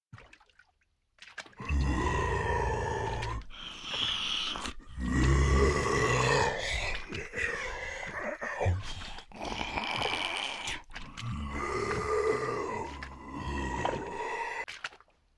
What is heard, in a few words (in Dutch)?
zombie rustig in moeras